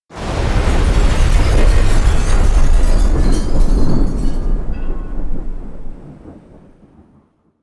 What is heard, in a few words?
Collapsing Building